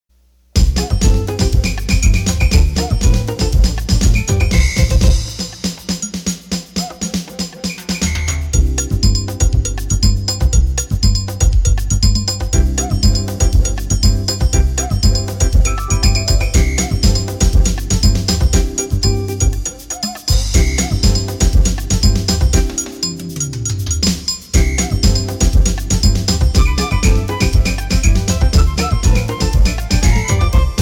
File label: Samba